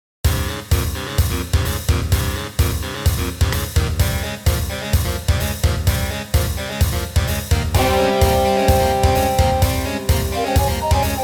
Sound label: music quest boss